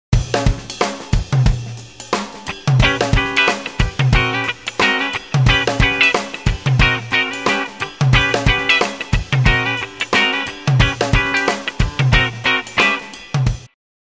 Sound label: Rhythm Guitar